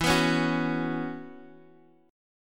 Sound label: B Suspended 2nd Suspended 4th